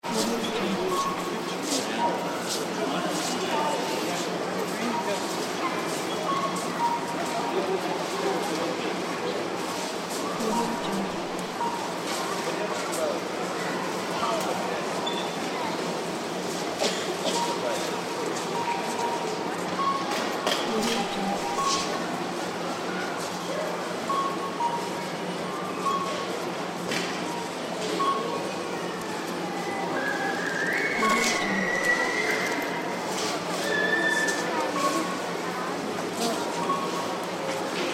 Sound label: supermarket ambience